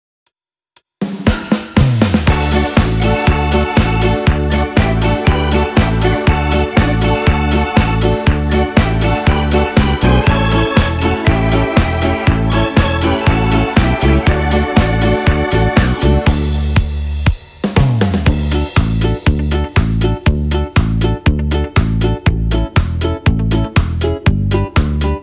Disco Schlager

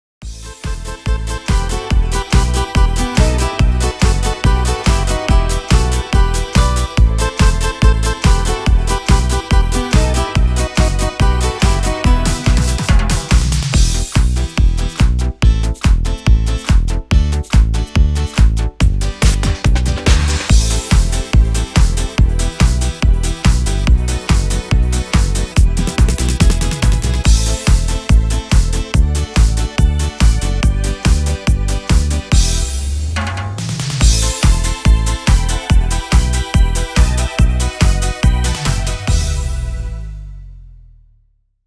Alias Disco

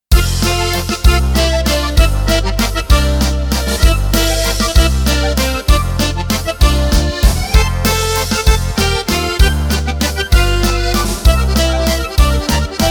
Folk Waltz

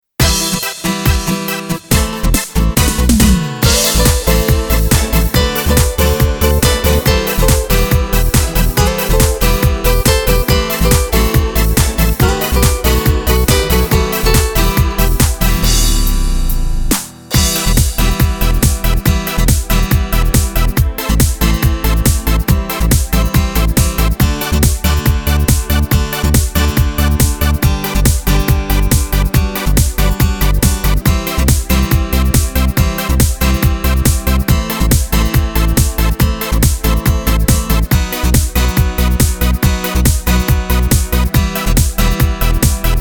Beat

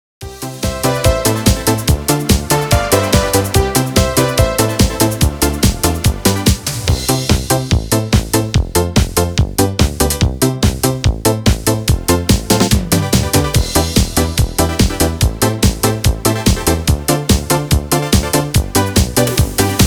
Classic Disco

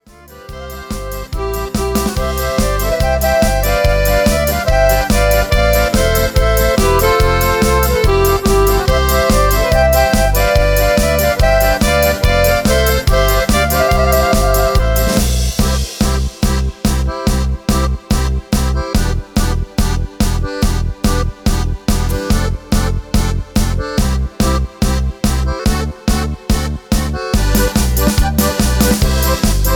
Folk

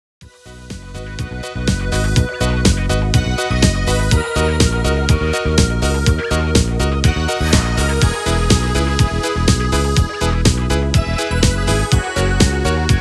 Schlager

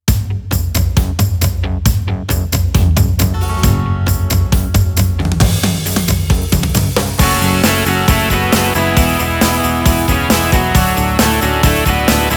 Rock

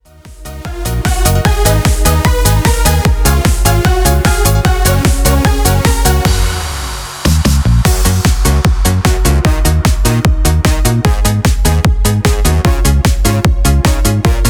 Viola

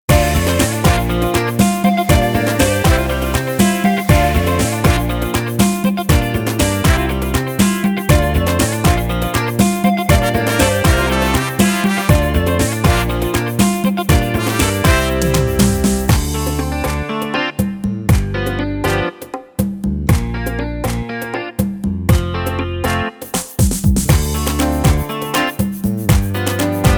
Afro Pop

